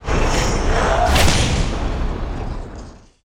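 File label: elemental attack, monster; lake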